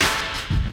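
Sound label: hit right; Spectrasonics; Liquid Grooves 1 & 2; CAVERN SW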